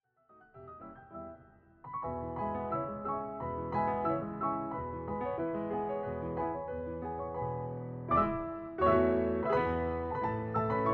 Hungarian Dance in E Minor Allegro ma non troppo, piano; brahms; 21 hungarian dances; WoO 1